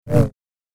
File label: Double Bladed Saber Sound; FXHome